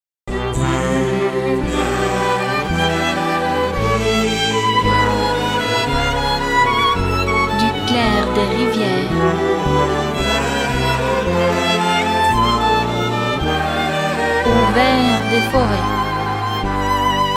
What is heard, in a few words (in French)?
VIOLON